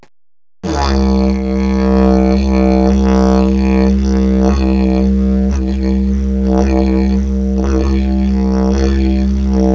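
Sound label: didgeridoo deep; digeridoo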